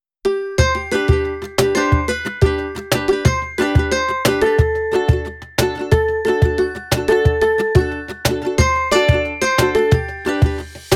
Happy Mood and Ukulele, This uplifting music track features the bright and cheerful sound; With a positive and upbeat vibe